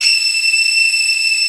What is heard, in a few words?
SPARCO VIOLIN